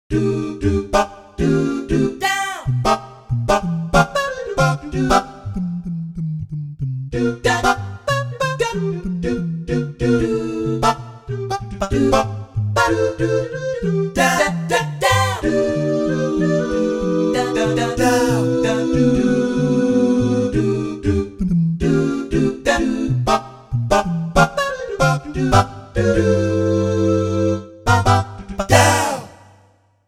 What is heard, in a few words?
jazz scat; HP-203